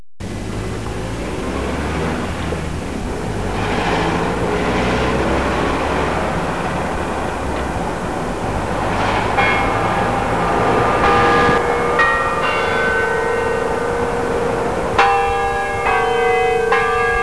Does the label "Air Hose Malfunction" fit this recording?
No